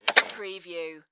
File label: Telephone Rotary Up; telephones; communication; Sound Effects Bible